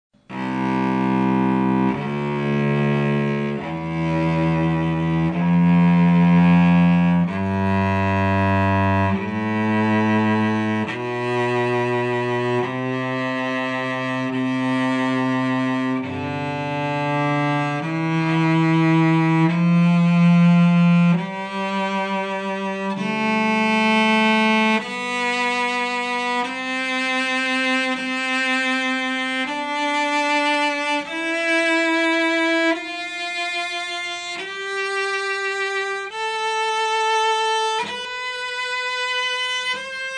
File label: Kreuzinger Tail Spike scale, cello